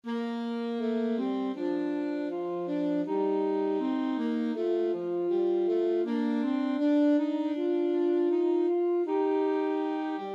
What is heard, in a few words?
Duets; 10 Progressive Graded Duets